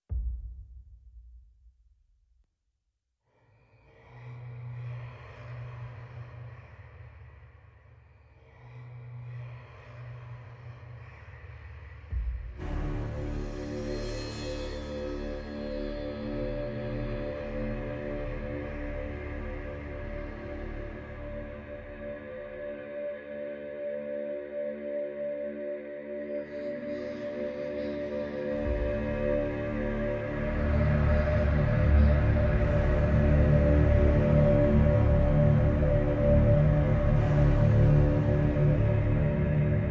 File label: No War Horn